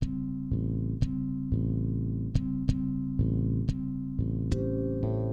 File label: HARMO BS, Bass; LiveAct Pool